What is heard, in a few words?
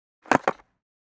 Skateboard stunt ollie designed